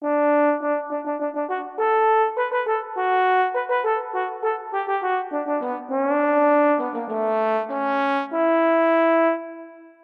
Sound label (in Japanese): trombone tsubuyaki, トランペットが高らかに歌います; 爽やか